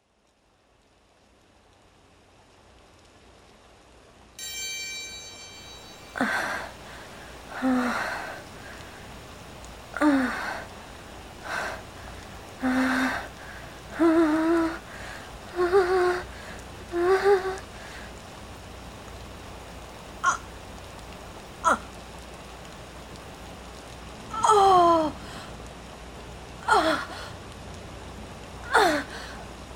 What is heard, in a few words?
F4A Orgasms soundscape rain; Storm Mix; Compilation; actually 36 orgasms; 30+ Orgasms